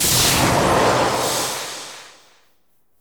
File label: casting spell buff